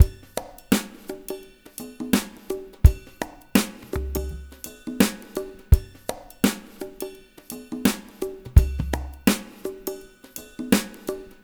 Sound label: DRUM MX; Spectrasonics; Liquid Grooves 1 & 2